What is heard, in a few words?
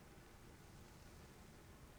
pulses, BALAENOPTERA; MONACO 2022-07